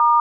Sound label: dtmf star tone; sipclients